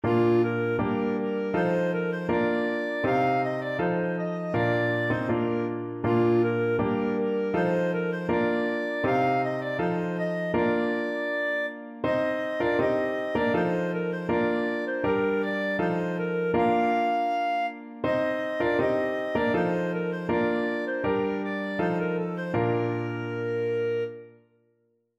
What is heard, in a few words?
ki eshmra shabat, clarinet